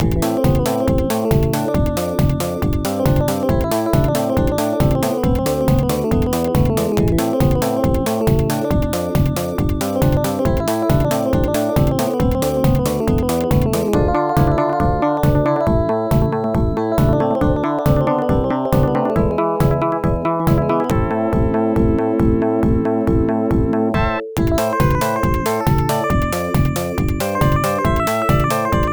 Menu Music

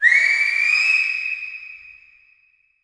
Whistle

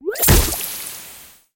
Power Bomb Used; In Power Bomb Used

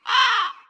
Crow Run

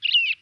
Music Frontend

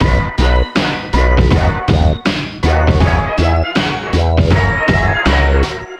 New Bpm80in L; Mojibribbon; PS2

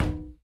Footstep Glass

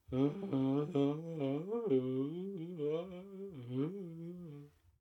Daze; Fknight

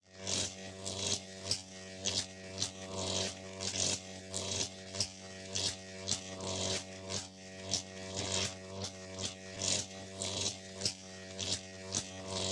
Enemy Electrified

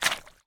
Footstep Puddle; Sx Footstep Puddle Norm 05

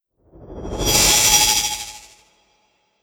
Enchant Frozen Blight

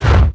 Monster Generic Foley Movement Fast

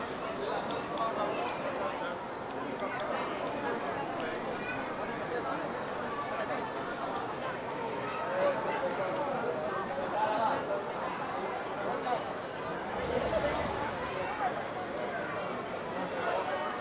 crowd

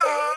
Ratcaller Damage

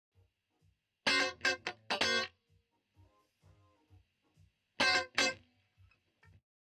guitar

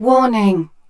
Warning

